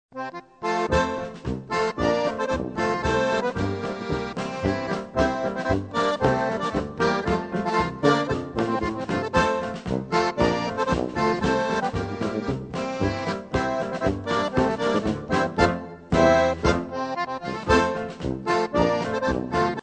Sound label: FLUTOPHONE POLKA